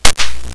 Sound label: gun